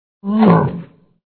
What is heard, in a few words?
sharkman attack voice middle